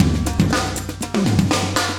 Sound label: 120, loops; Sound Effect Library